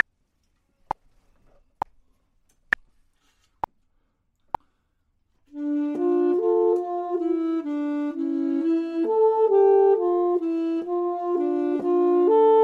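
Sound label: both, Saxophone and Clarinet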